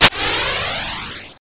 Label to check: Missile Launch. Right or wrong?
Right